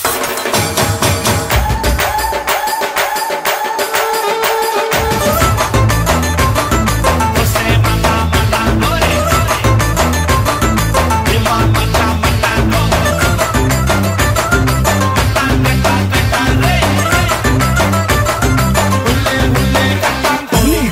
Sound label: BG Drum Beats Tone